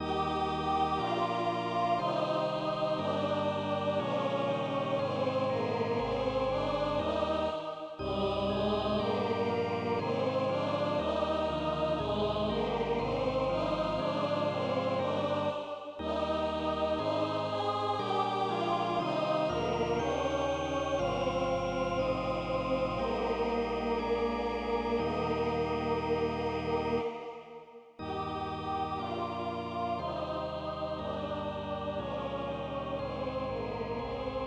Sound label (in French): Choral final Passion saint Jean Mib M Allemand Choeur et orgue; Allemand-Francais; Bach